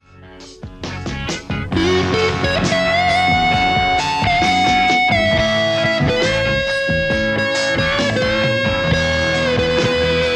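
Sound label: Telecaster; poulin lextac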